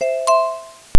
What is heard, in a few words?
Ding out; Apache; Slide Sounds